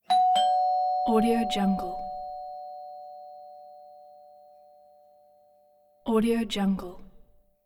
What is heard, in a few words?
doorbell dingdong